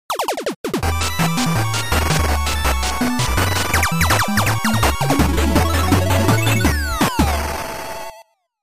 Lucky Weve Arrived Jingle

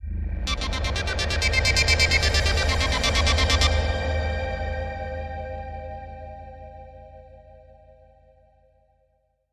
Sonic Blips, Crazy Version

